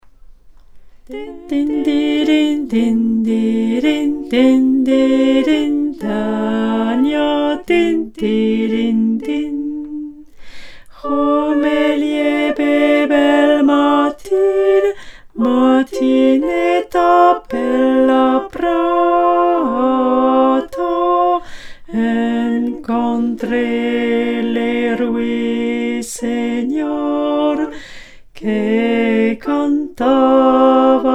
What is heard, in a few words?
Basses